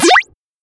Ai Question Mark

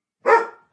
Dog bark close

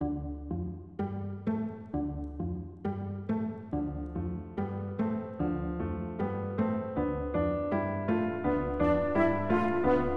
Arc Of Suspense

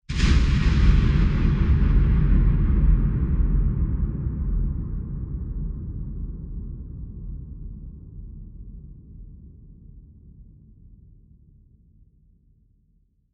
Huge Explosion Thunder Exploding

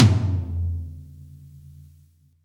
Middle Tom Wet

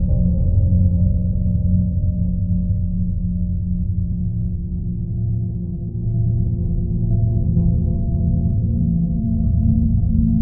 Very Creepy Ominous Horror Music

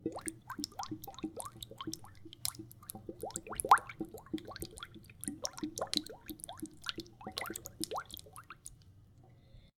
Running Water Bubbles

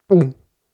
Gulp Sound

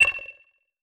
Stab

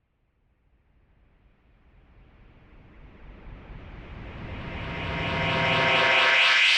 Reverse space cymbal

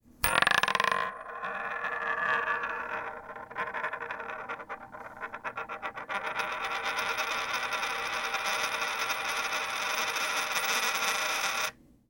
Spinning Coin

